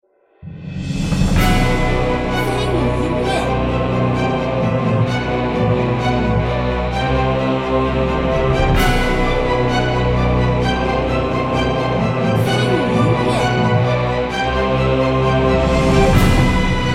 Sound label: BOSS tongyong